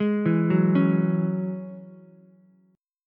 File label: F sharp 7th Suspended 2nd, Ukulele; arpeggiated; 1,4,2,4